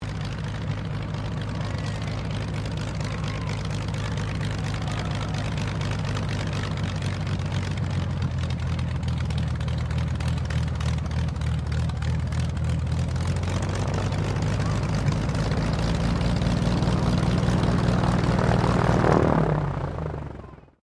Aircraft CT4A Taxi Shutdown, RAAF CT4 training aircraft; Airplanes; Vehicles